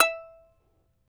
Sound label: charango E4, guitars; Spectrasonics; Hans Zimmer Guitars VOL-2